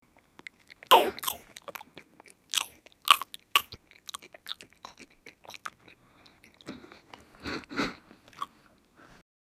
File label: the boy eats loudly makin; eating